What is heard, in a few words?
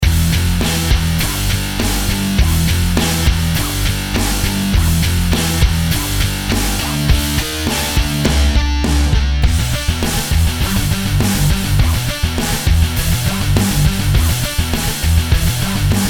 Ultra II Riff